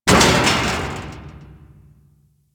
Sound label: Grate Fall B; Warframe; Lotus Intro